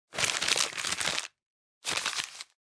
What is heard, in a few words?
Paper Scrunch; noise creations